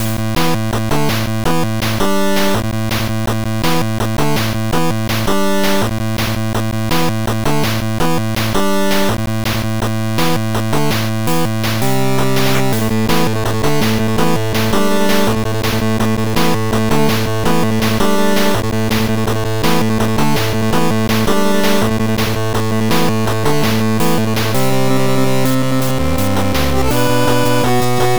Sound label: 8bit Battle Rush